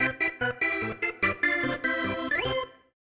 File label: organ